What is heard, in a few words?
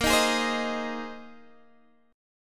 A sharp 7th Suspended 2nd Suspended 4th, Guitar, DADGAD; down